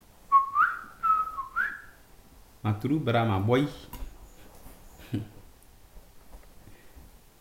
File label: bird imitation sentence